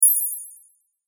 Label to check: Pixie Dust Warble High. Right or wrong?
Right